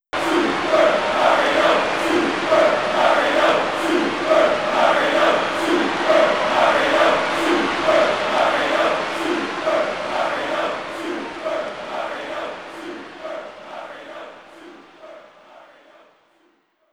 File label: Crowd Chant; mario strikers charged football wii gamerip 2007